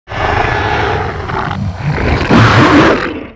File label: alert; diablo